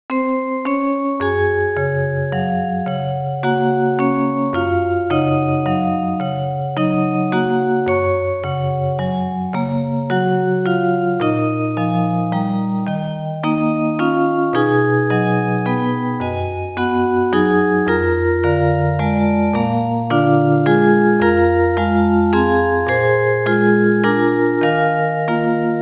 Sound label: bell; concert